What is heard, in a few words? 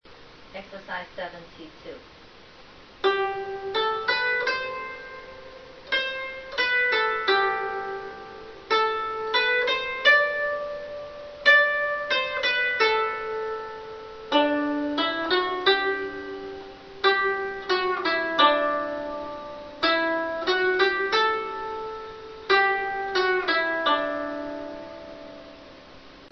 zheng